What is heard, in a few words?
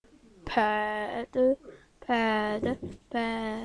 pad